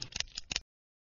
Double Barrel Shotgun Cocking

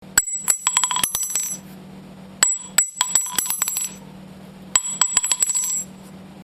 50 Cal Casing

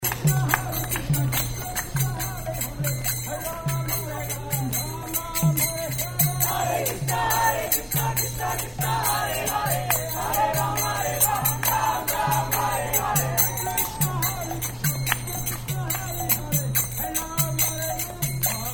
Hare Crishna Street Chanting

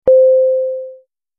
Electronic Chime; KevanGC

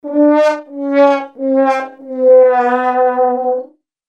Sad Trombone; Joe Lamb

